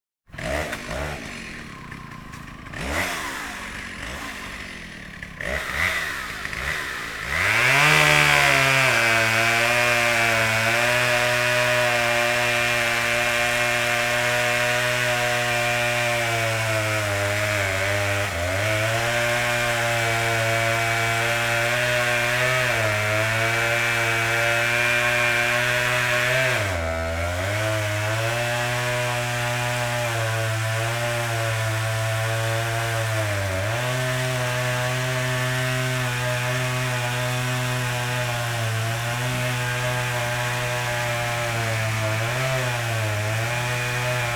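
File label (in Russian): бензопила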